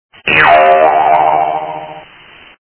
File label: Boing